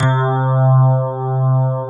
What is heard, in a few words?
FM ELP 8 C2 R, Keyboards Old School; USB Soundscan vol.09; AKAI